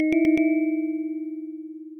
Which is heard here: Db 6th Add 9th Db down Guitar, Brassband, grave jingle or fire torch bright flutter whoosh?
grave jingle